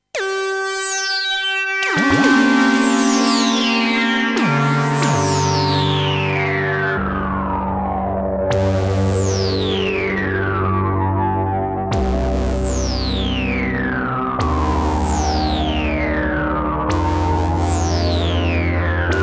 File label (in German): Lead und Bass Filter